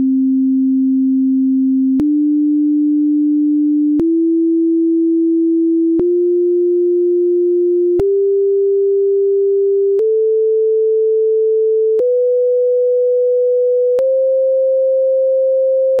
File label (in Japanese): 純正律音階, Cdurで音階, 波形はサイン波, 音量は-10dB, 時間は各2秒; 平均律と純正律